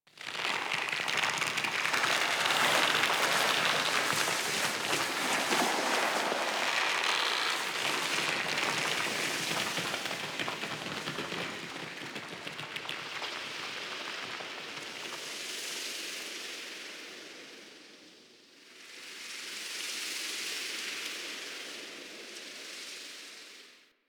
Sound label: Roots; Warframe; Rail Jack; Rail Jack Reliquary